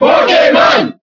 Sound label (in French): Cheer French; Pokémon Trainer; SSBB